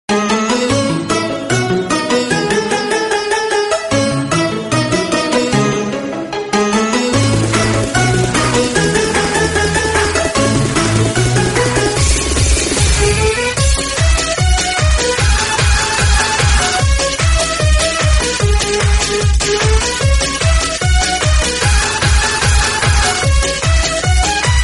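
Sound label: very strong drum music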